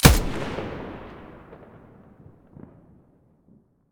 mdr muzzle stereo, weapons

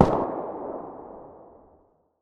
galil muzzle mono very far

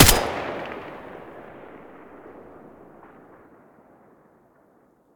M4A1 muzzle mono

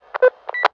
pda welcome; STALKER; IWP

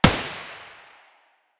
p90 muzzle mono distant, weapons

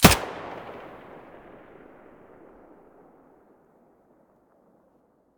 mp5 muzzle mono, weapons

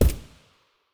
sig550 silencer far; weapons

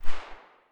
silencer p220 mono very far, weapons

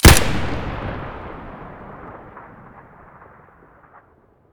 sks muzzle stereo, weapons

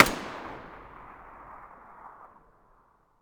mp5 muzzle mono far, weapons

